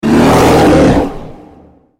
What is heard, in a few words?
ultimate effort; Volibear